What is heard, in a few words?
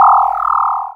Cry